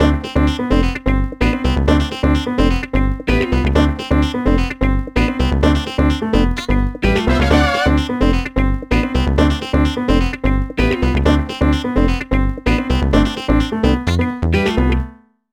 Punky Funky

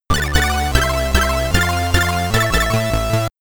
Stage Clear (SID Stereo); Re-Bounder; Commodore 64

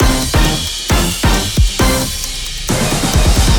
Clocktower Intro; Splash Blast Panic

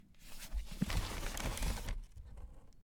foley pick up

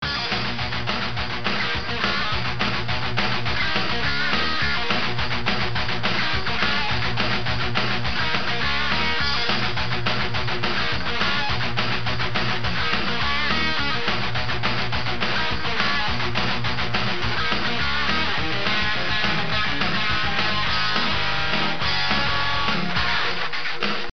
Character Select No loop; Ooedo Fight; Blood Warrior; Kaneko

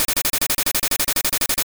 Drill Peck; attack moves; pokemon; gen 2; gsc

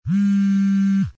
Phone Vibrate

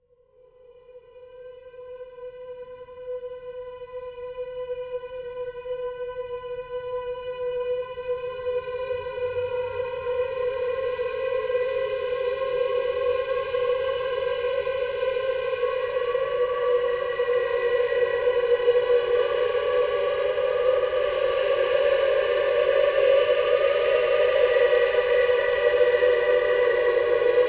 Choir; Outlast 2; PS4 Windows Xbox One

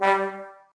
G Trombone

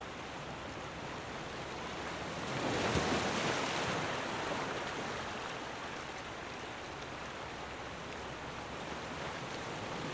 Ocean Long Rolling

